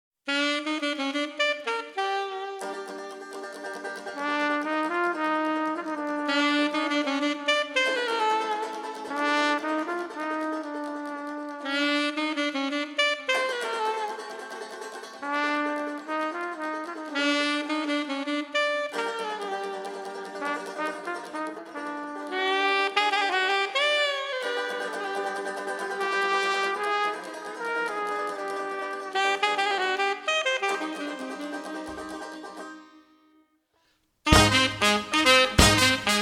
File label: Dixieland Band